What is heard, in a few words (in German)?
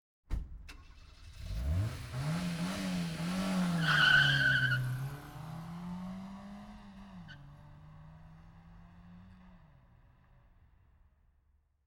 kavalierstart; geraeusche digital